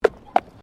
Tram Horse Drawn Horse Step Gravel; Horse drawn tramcar; Carriages; Vehicles

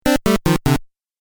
8 Bit Style Fail; Video Game Sound Effects

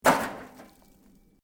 METAL SIGN MOVE; Sound Effects:Materials; Foundation3

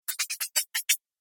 Robot Metal Circuits, sci-fi robot sound effect; Robot Sound Effects; Andrew Fleming

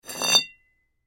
Baseball Bat Pick Up Metal Scrape Cement; SPORTS

